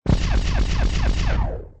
tnt; mob

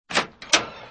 open close, alchemy; tile

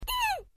hurt, elf; race

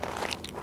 hit, crab; mob

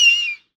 eagle hurt; mob

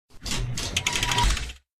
gold chest

